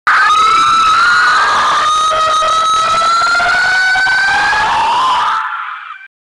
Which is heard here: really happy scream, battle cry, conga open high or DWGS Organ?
really happy scream